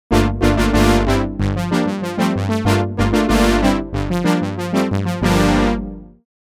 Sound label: clear OB brass; pro12